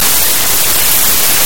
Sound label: Baby Lauging